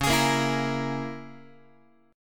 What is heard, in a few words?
Db Minor 6th Db down Guitar; Dbm6 strummed; x,9,11,8,8,9; JGuitar